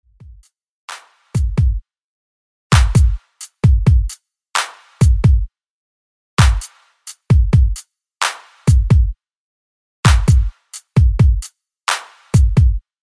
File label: Top40 Redrum 131 Bpm Clean